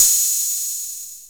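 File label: open hi-hat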